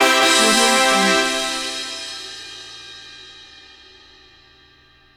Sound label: triumphal fanfare